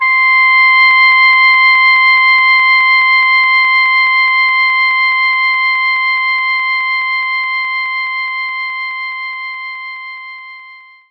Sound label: Oboe C5; knights try